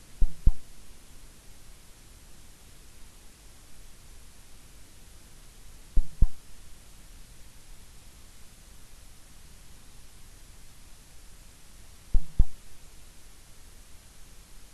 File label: WFR audio emfisis